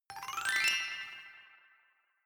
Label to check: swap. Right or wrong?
Wrong